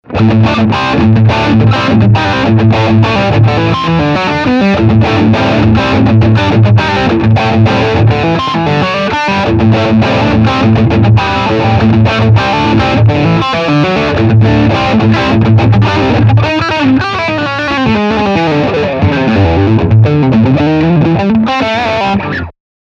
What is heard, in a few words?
Redline Overdrive